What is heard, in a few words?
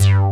JS BASS; Pro-Rec - Synthsonic; BASSES